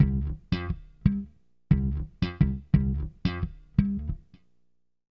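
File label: bass 88 D minor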